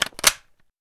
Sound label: Inf Weapons Foley; Red Orchestra Ostfront 41-45